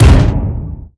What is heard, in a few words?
Small Impact; Phoenix